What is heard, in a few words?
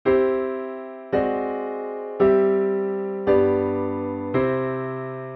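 French 6th in major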